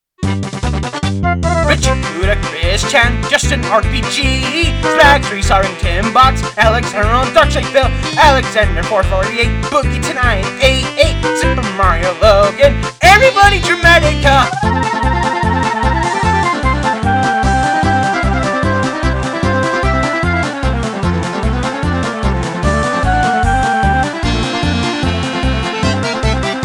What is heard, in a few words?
Polka